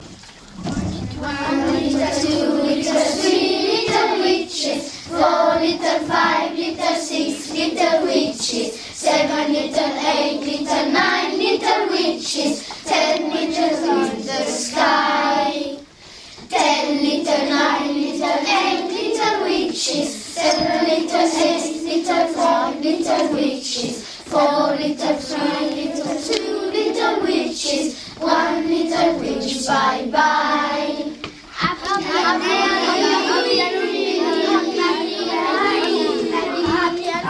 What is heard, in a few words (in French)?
chant